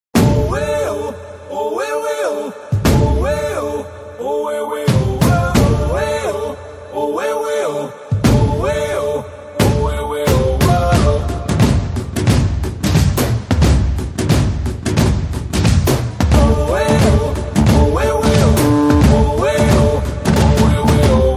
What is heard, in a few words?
BGM Drum Beats Telugu Ringtone; Rang De